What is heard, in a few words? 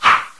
flash; BurningMySoul